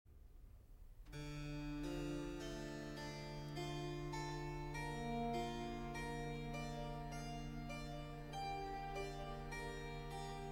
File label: Violin Concerto in F Major; Vivaldi; The Four Seasons; Op. 8 No. 3, RV 293; Autumn